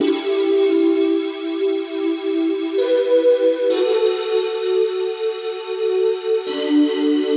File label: 130 E Synth Bottle Pad; Zero-G; Future Wave